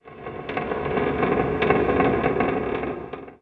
CREAK; PC Gamer IT CD 46

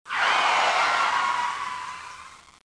skid; 2000 Sound Effects

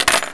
dice

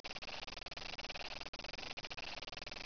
gears; Image Bonanza

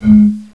BOTTLE

